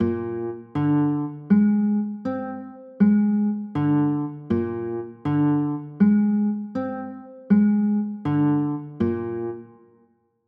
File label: a sharp 6 9, chords